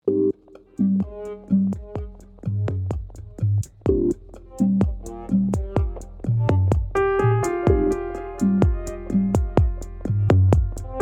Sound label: chillout lounge